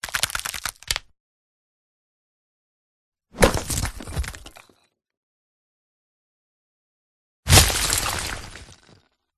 skull detach fall roll crush; george gazetis

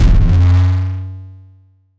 sound design dark resonating metalic hit; dustyroom

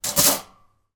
shopping basket metal empty drop down on ground; foley; zapsplat

